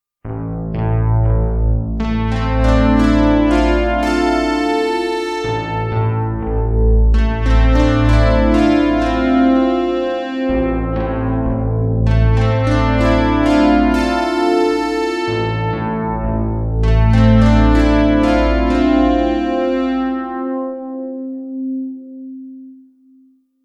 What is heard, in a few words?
Polysix Fat Solo Sound